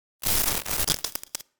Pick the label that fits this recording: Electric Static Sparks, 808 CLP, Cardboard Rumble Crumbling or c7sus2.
Electric Static Sparks